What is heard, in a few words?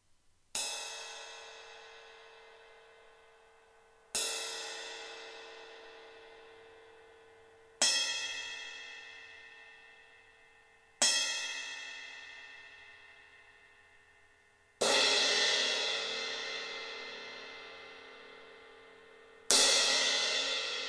Crash; Amedia Dervish; crash bekken 18"